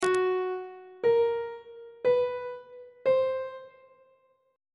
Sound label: g flat 4th interval pitches; on treble clef